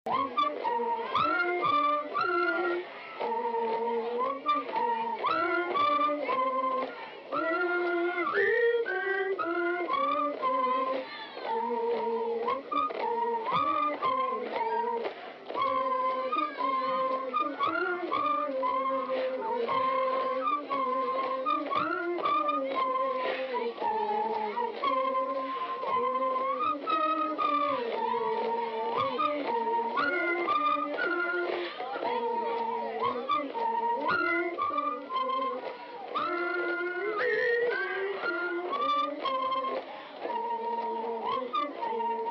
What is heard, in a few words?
scout whistle; Little Nightmares 2; 'hypno' Scout Whistle'; Little Nightmares II; Xbox; Xbox Series X/S